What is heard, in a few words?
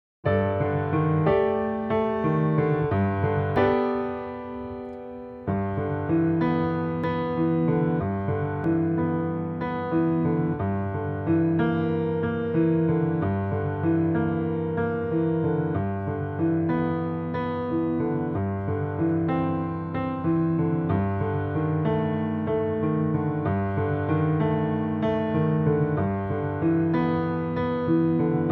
Snowfall Improv Track